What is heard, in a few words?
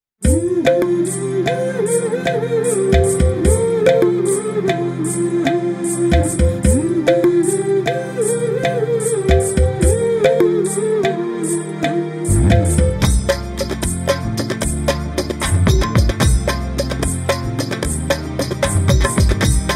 Jingle Instrumental; Dudhsagar